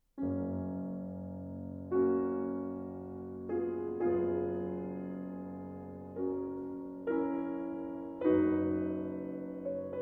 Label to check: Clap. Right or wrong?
Wrong